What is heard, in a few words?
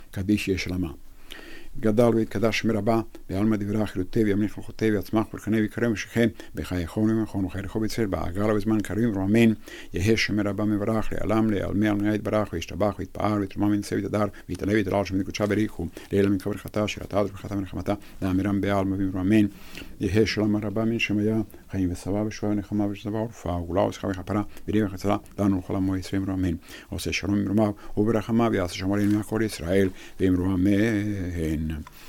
Kaddish; RH Minha; yehe shelama